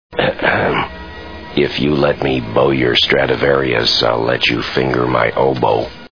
oboe

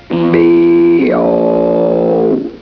beeee oohhhh

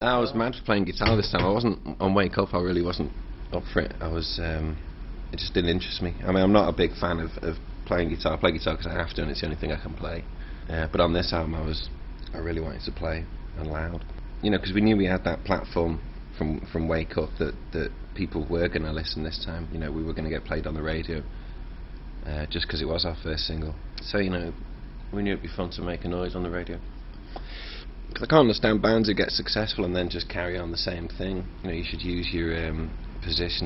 boo

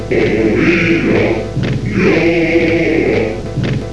oz chant

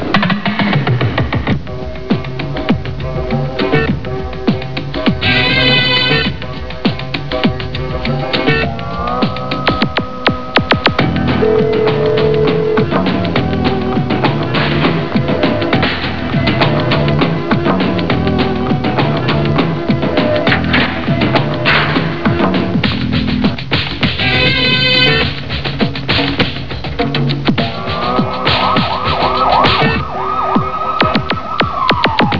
theme 8bit

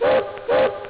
toot

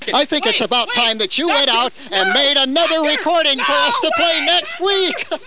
yell

